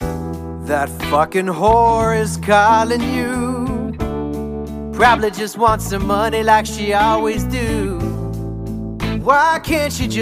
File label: blues ringtone